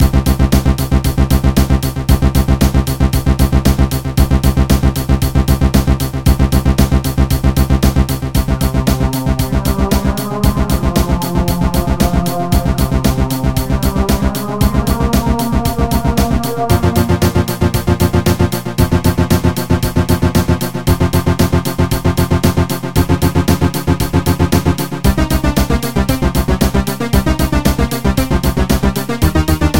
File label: Game Over